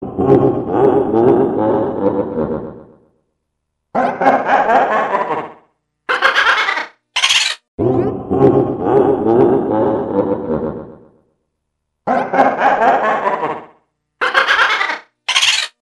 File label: bowser to boo laugh; mario64